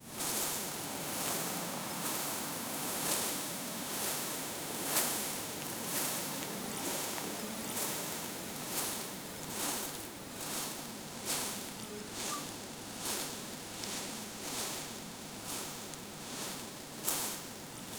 mountain sound grass move

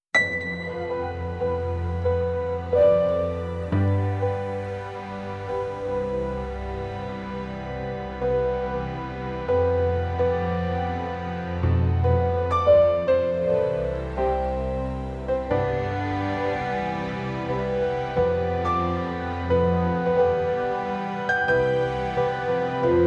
a steady uplifting cinema, soft piano

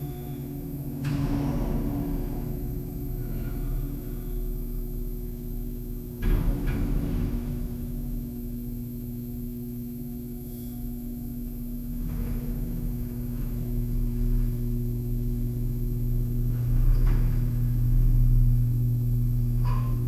soft high heels on tiled; background ambiance

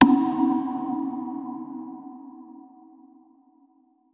hollow reverb

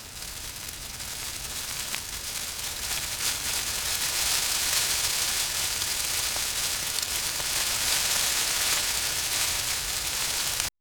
gentle rustling of leaves in a forest

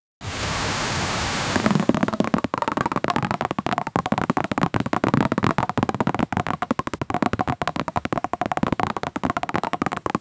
sound of a cascade of, tennis

